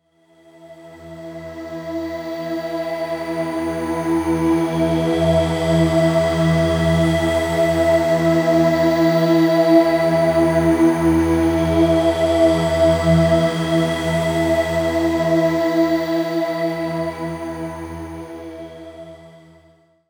Pad E; One-Shots; Pads; Ghosthack; Color Bass Essentials

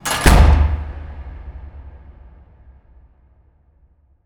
impact hatch; Hits, Impacts

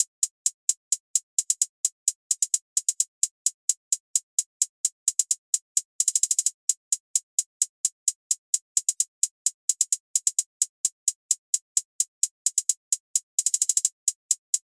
Drum Loop 130 BPM Hihat; Loop Stems; Cymatics; DOPE DRUMS VOL. 1; Flavor Drum Loop

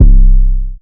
808 - C; Illusion; Cymatics; 2022 Melody Mystery Expansion

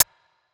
Hihat; Ghosthack Advent Calendar Day 4

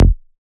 Ashes Bass F#

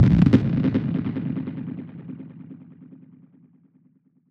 Squelch A# 145 BPM, Psytrance; Ghosthack; Psytrance Visions Volume 2

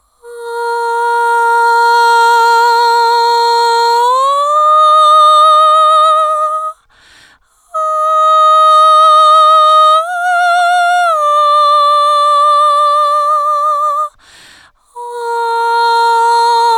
Counter Vocal, Cm 65Bpm; Ghosthack; SkyRealm

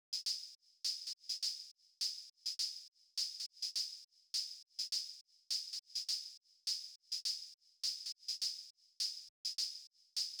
Drum Loop - 103 BPM Hihat; Loop Stems; Cymatics; DOPE DRUMS VOL. 1; Street

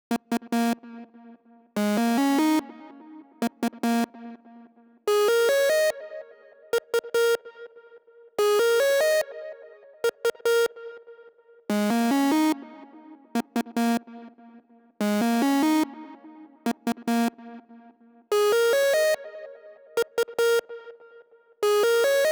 145 BPM D# Min Lead; Loop Stems; Cymatics; 2022 Melody Collection - Wavy; WTF

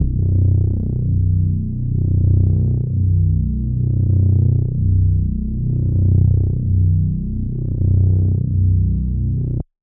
Dark, Deep, Dark

